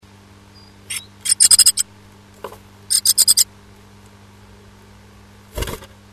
nestbau rufe, nistkasten

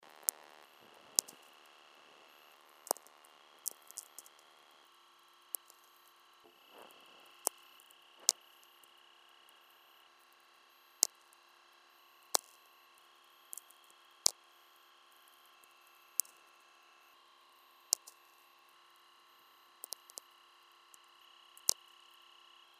Rodelhuegel Unten VLF

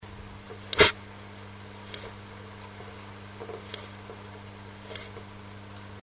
meise schnappen, nistkasten

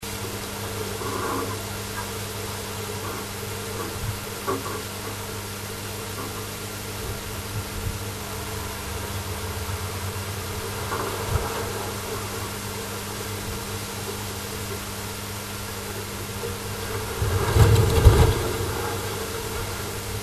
sturm; nistkasten

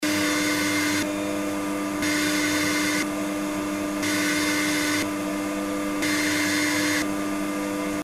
ohne Behaelter Vergleich; blendtec 625

